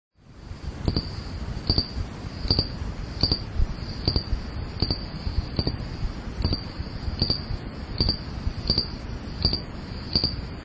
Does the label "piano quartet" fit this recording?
No